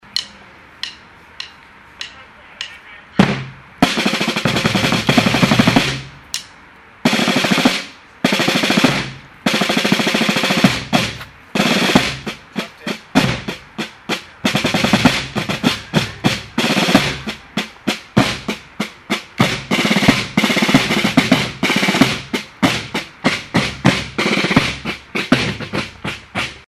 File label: Drums